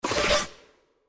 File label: Grass; Qiyana